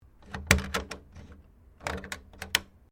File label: KEY LOCK OPEN; Locks; Objects